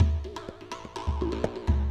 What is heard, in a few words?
PERC LOOP ETHNO 125